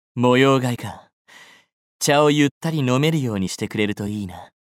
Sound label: Uguisu background